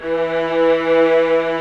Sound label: ARCO VIOLAS; OMI - Universe of Sounds